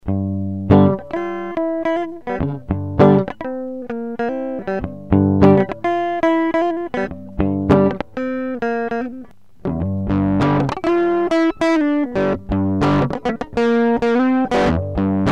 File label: Guitar Dist